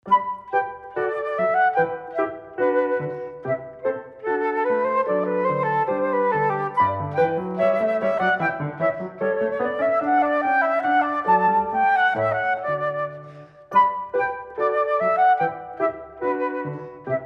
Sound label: gavotte; j.s. bach